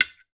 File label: household object hit against washing machine glass door window